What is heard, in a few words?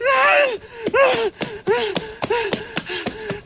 running